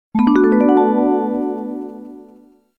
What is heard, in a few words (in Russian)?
sladkoe sms otlichnaya melodiya sms dlya